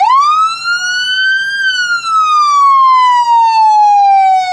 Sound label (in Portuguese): sirene